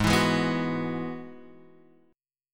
G sharp Minor 7th Sharp 5th, Guitar Half Step Down